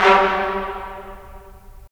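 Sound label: brass trumpets staccato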